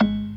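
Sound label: PERC C sharp 3; Best Service; Advanced Media Trax Modern Composer; B3 FULL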